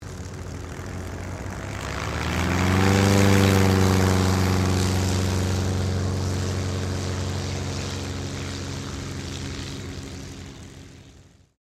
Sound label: AIRCRAFT CT4A TAKEOFF; CT4 military trainer aircraft; Airplanes